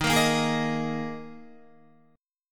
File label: Eb Suspended 4th Eb Guitar 4F 4A 5C 5G 5C 6E; Ebsus4 strummed; JGuitar